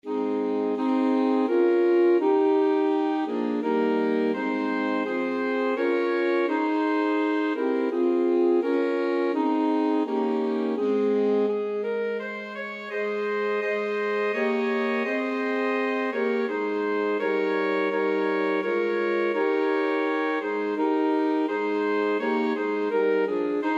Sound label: alto sax quartet